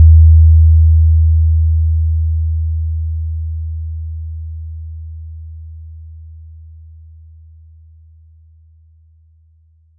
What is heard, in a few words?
80Hz Decaying Half Life of 3 Seconds